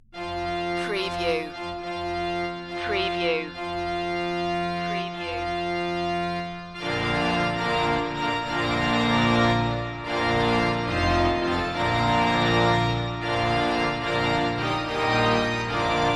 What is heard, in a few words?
bridal chorus church organ; wagner